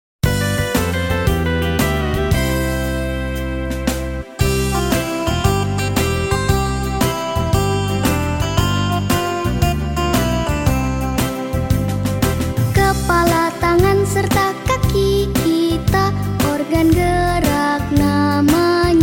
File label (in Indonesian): Lagu Organ Gerak Nada